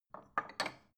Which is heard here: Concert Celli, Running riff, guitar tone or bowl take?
bowl take